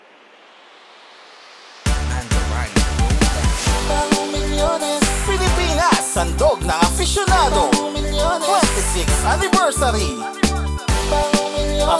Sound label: JINGLE; 26TH ANIV